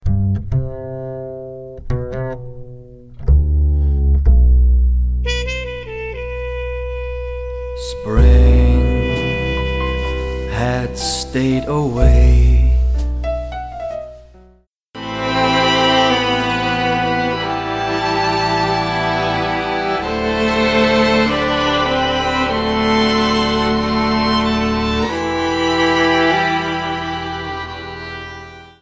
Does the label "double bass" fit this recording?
Yes